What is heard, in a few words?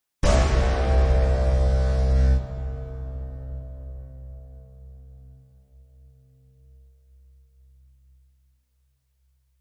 Impacts Horn; Gothic Storm Music; Advanced Sound Design 2012